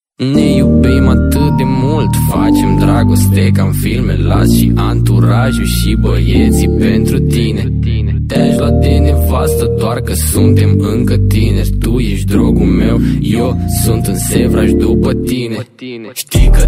hip hop

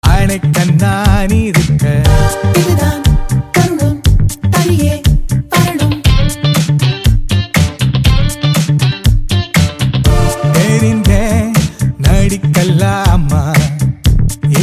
tamil romantic

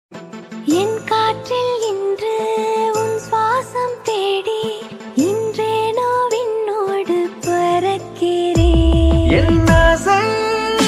tamil

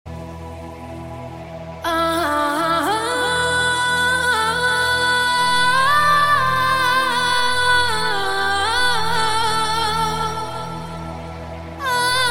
arabic house mix

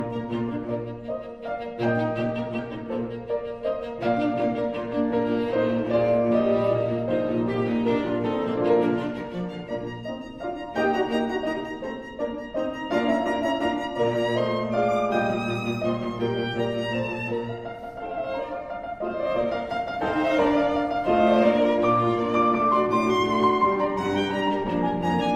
piano; mozart; schubert; schumann

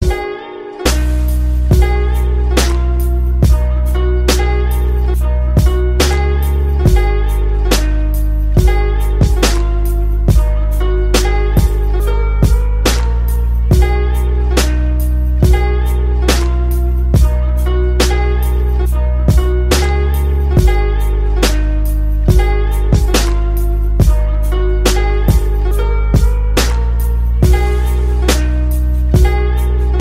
apple rude tone

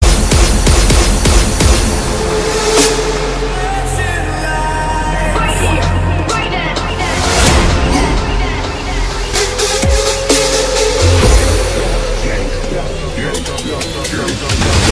trance

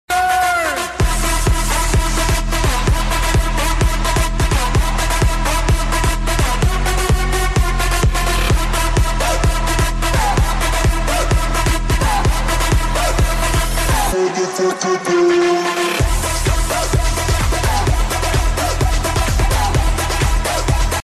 for you beat

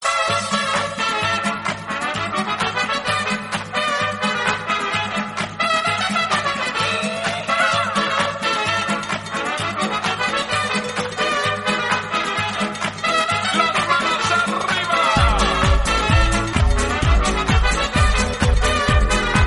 mexican hat dance, ringtone

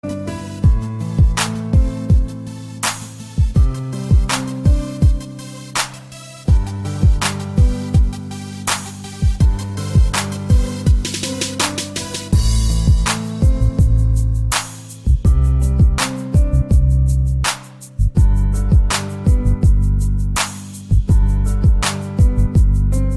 love piano rap

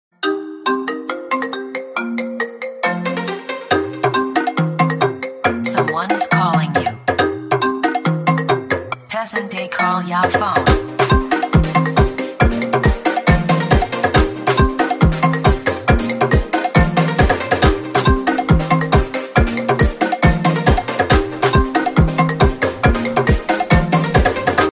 african ring; iphone

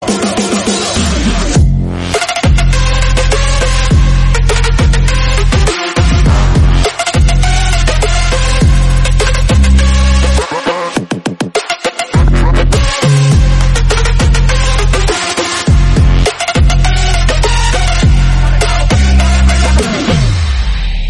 electro

